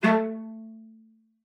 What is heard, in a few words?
A3, CELLO SPICC; Xsample VOL-5